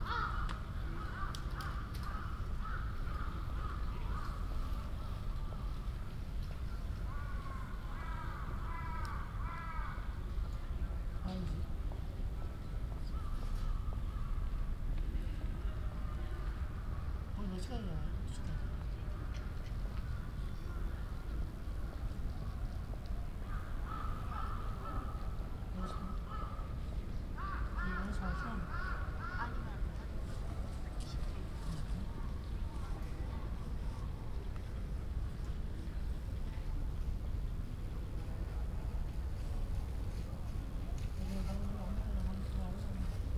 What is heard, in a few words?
Ueno Bells of Time; binaural; Japan; Japan by Ear